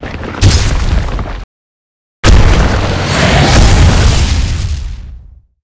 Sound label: trap earthquake; monster